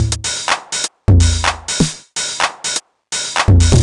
drum tech top 125, Drum Loops, Classic Chicago House